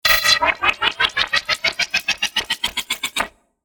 Glitch accent distorted computer; gfxsounds

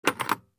Picking up metal scissors

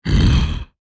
Werewolf angry grunt

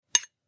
Knife clash metal on metal impact

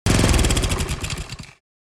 Electric lawn mower engine stop

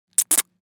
Sexy woman short kiss

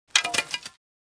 Garden tools rake falling on the ground

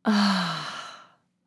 Young woman moan with relief

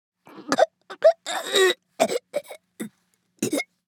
Woman choking

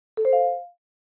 Notification alert

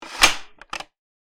Rifle bolt cycle; assault rifle

